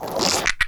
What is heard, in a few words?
bugbait squeeze